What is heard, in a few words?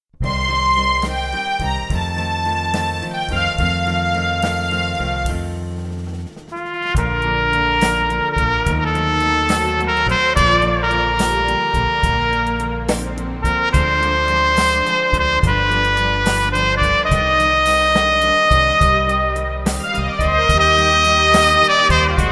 loving trumpet